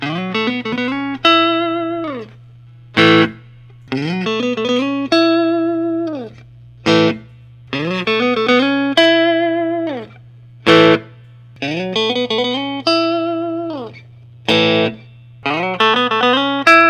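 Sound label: 54 classic s brazil, guitars; michael tuttle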